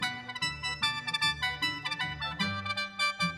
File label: Sheng